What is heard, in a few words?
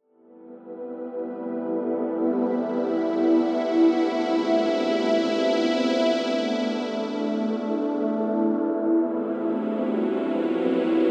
Midnight Session Pads